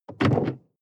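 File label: sports car interior trunk close hard; verkehr transport; autos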